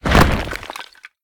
wall up; Smite; Cabrakan

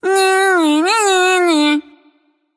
tongue light; smite; voicepack; hel

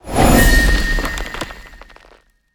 activate; smite; hera

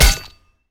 basic hit; smite; osiris

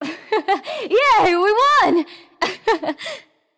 "yay"; smite; voicepack; nuwa

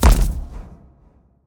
footstep; smite; npc; grkconqs8 titan chaos; s8chaostitan

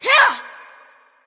freya grunt

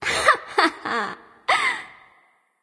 hehheh; smite; sol

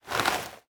armor; smite; chaac

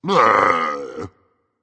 ugh berserk; cuchulainn

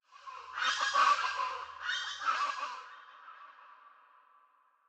ambience good bird; smite; conquest

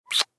kiss; smite; chiron

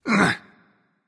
grunt jump; smite; hou yi

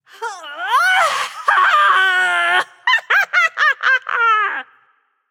battle cry; smite; babayaga

